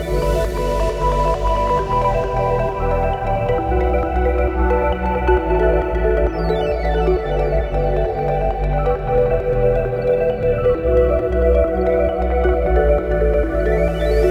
Music Rhythm Stage Menu; sayonara wild hearts 2019 gamerip

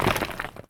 dirt

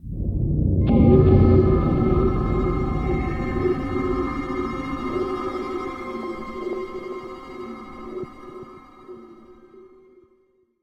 Mission Failed (Player Death)

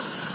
Scrape; Cut the Rope Holiday Gift; android ios mobile gamerip

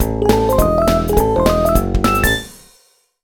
Level Clear! Fanfare; mario vs. donkey kong 2 march of the minis; mario vs. donkey kong 2 minimini daikoushin; nintendo ds; 8th Floor Fanfare

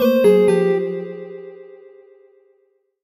jingle chime negative

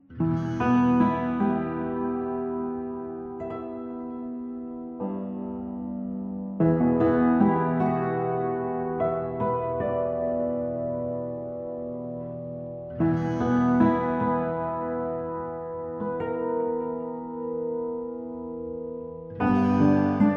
Western INSIDE LOOP; Heavenworld

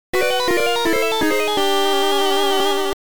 Bonus Stage - Lose; the cowabunga collection

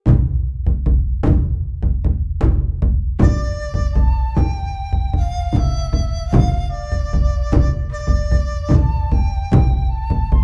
monk theme loop

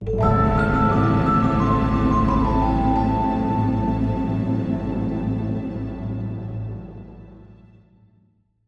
Winter Ambient Night ENDING STINGER A minor

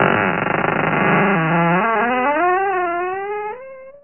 Door; Buggy; PS1

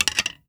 Arrow Nock; Timelock VR

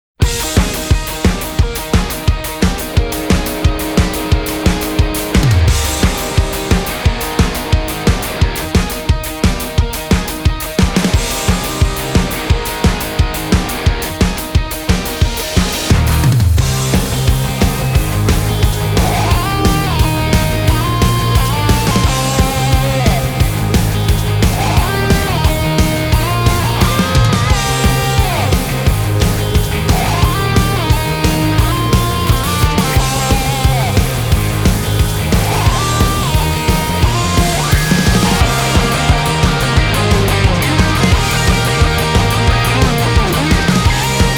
instrumental-; Heaven Burns Red

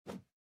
whoosh generic

